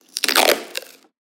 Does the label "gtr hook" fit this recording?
No